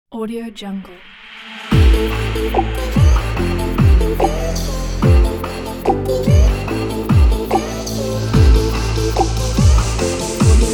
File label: the modern logo pack